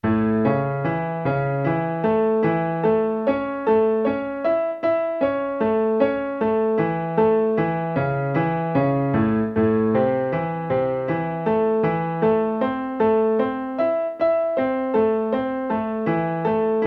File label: triad and inversion broken chord triplet in A and Am